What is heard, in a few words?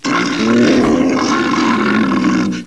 Orc Cap Attack